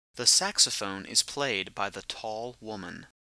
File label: saxophone